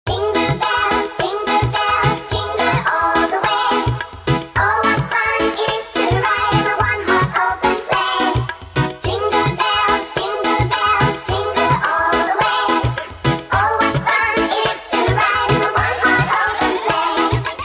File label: Jingle Bells Remix